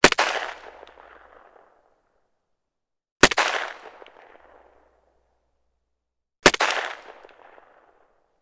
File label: gun carl gustav m45 swedish k; still north media